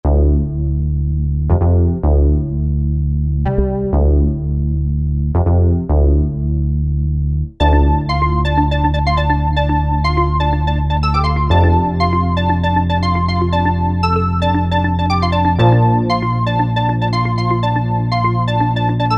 Housy Bella, Roland SR-JV80-11 Techno Collection

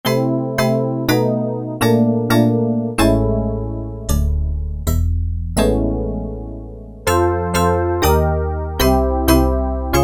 Metallic E. Piano, Roland MKS-70

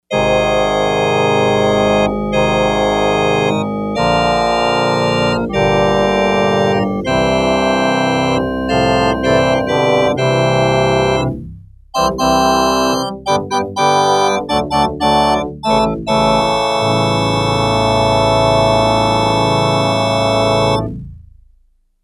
Full Ranks, Yamaha DX100; Factory Patches

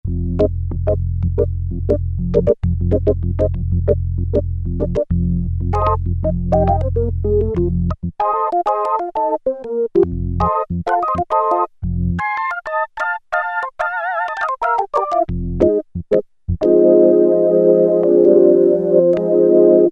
Organ, Korg PolySix; Factory Presets Bank A

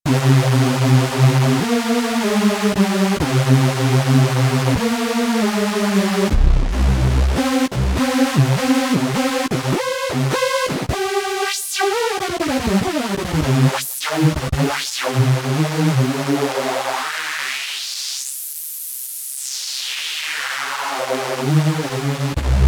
Hyper Hoover, Korg Radias-R

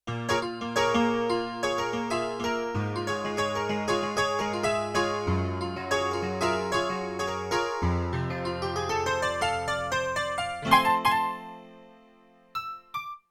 E Piano & Stereo AP; Yamaha TX16W; Jazz Performance; Jazz Performance 2

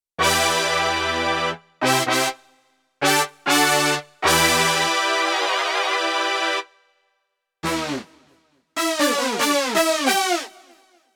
Slap Brass, Korg Radias-R

